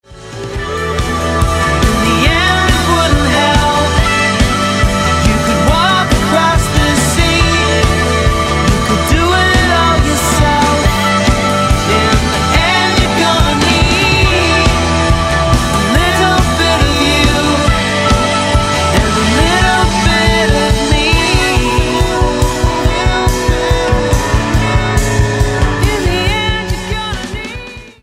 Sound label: ringtone